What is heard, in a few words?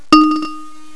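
XYLOPHONE NOTE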